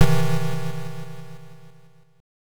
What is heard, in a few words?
Shock; Fray; MSX2; PSG